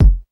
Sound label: smooth bass drum F sharp key; kick drum; kick drum samples